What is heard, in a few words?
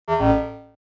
incorrect